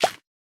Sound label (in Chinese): 堆肥桶清空声